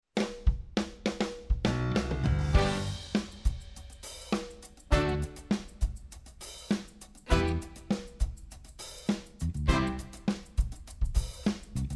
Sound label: instrumental; One Hundred And One; 101 Dalmatians; Douglas Hodge